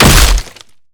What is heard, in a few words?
basic attack; LoL; trundle